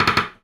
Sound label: Door Creak